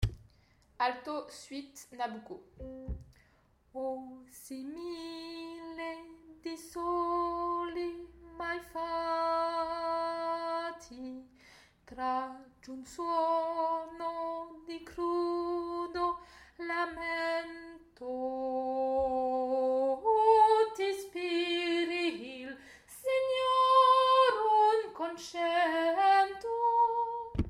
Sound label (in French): altos suite nabucco